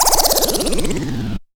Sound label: Warble Down; Jungle Warfare 1&2